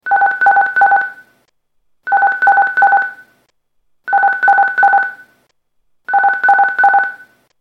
notification beeping tone

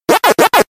pacman sound

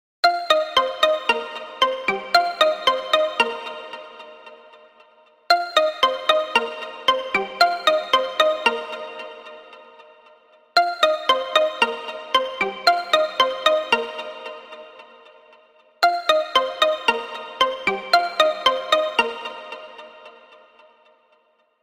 digital xylophone ringtone

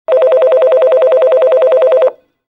office telephone ringtone

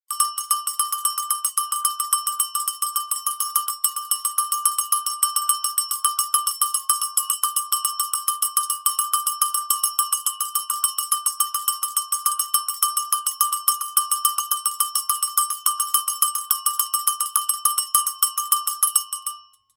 Dinner bell sound

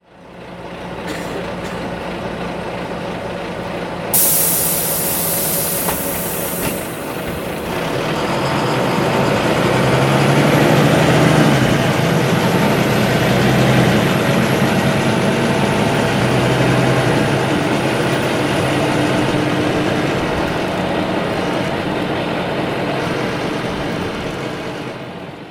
inside city bus ringtone

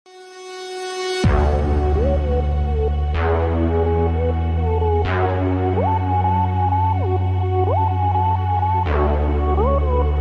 African Ringtone

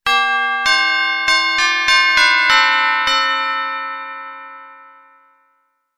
christmas notification tone

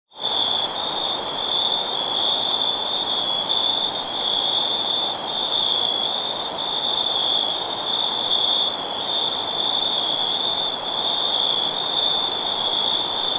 cricket sound

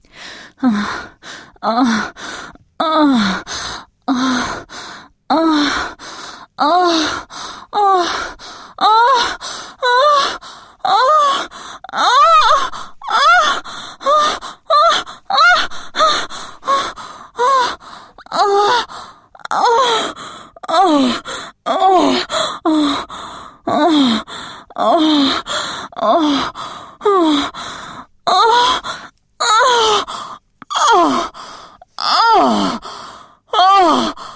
Woman Moaning Sound Effect

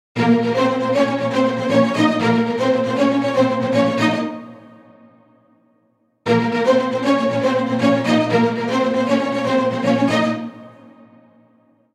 action ringtone

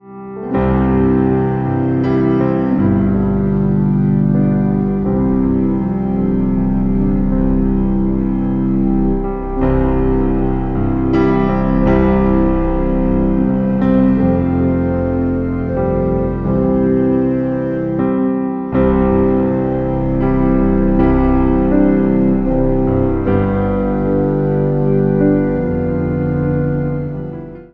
amazing grace ringtone

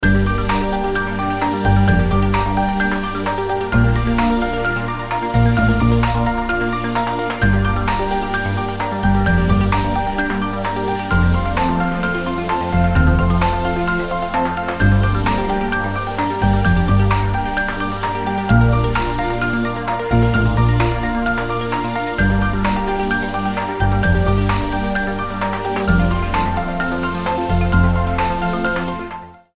gentle piano music ringtone

